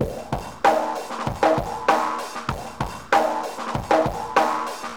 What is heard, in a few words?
ring mod, drum loops